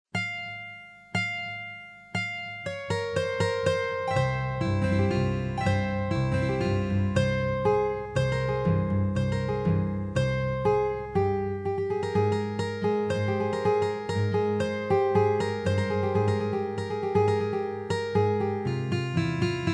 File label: Guitar